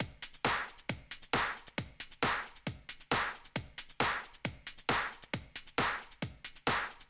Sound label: 135 Nokick; Zero-G Trance Inducer; 90 s Sample CDs